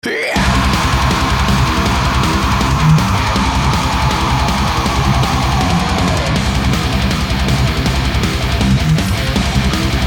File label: MIX AXE